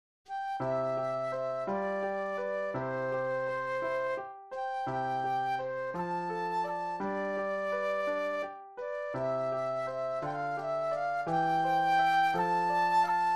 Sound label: Flute Solo; classical